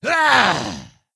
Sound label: attack; Skadi The Ruthless